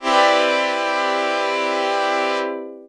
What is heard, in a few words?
Strings, dx7